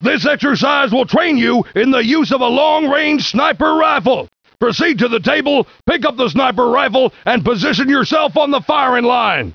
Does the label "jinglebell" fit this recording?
No